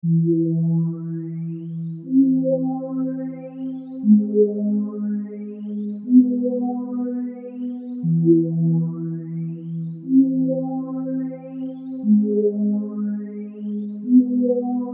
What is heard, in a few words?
Fantom G6